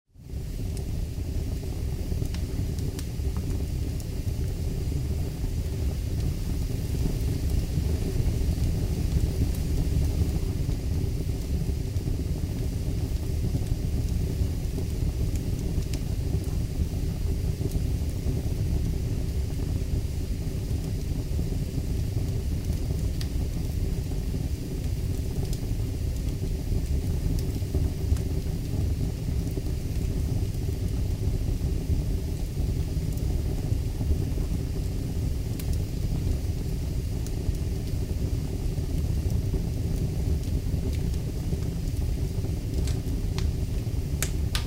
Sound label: Firewood is burning in the fireplace, damp wood hissing